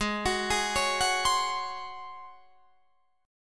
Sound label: Ab 11th Ab Guitar Drop D; Ab11 arpeggiated